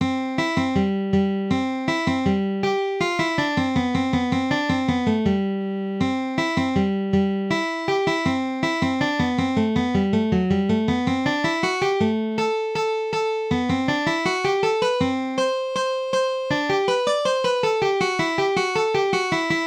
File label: Guitar 1; Clementi Sonatina No. 1; first movement